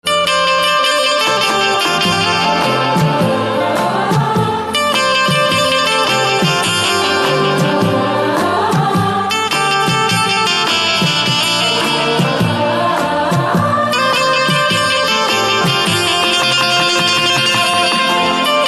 Banjo Instrumental Ringtone

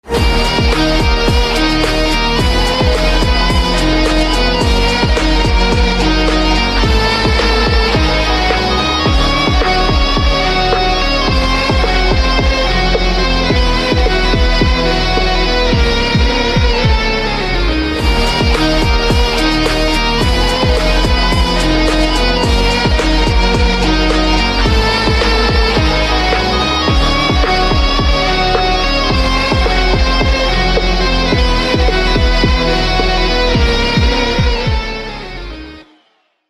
BGM guitar; Movie; Race

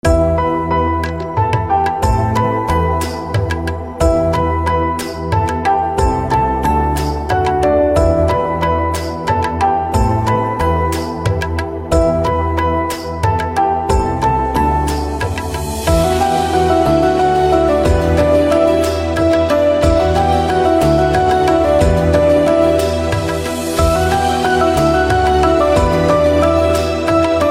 Piano Instrumenatl Ringtone

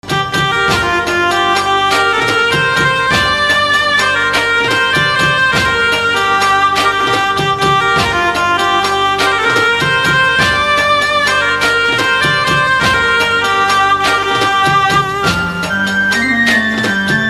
Trumpet Instrumental Ringtone

